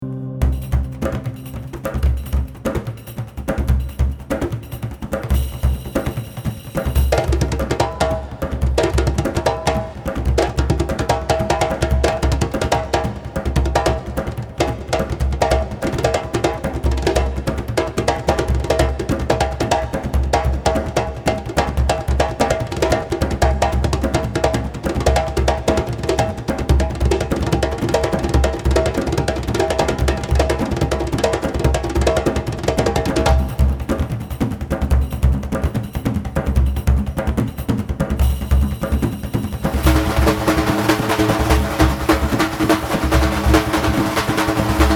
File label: Percussion Ensemble Playing